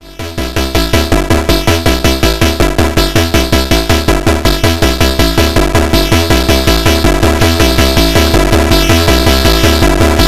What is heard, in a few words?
Sequenced Synthline with Rust; Body Synths Metal Fetishist